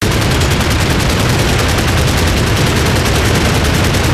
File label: pendulumturret fireloop enemy; gow